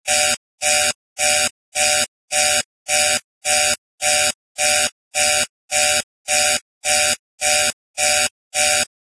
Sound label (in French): alarma, ambience